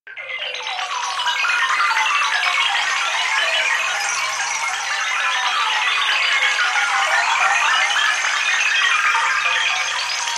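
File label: electronic sounds of data transmission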